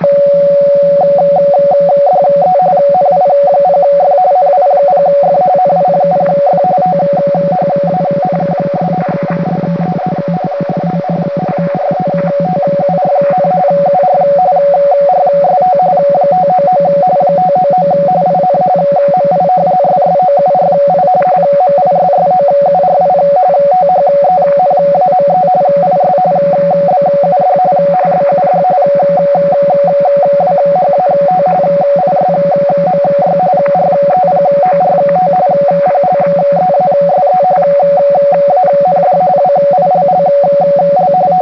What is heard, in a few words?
rtty